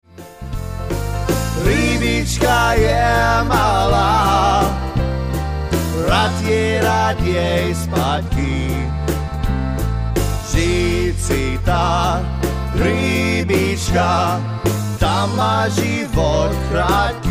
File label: Waltz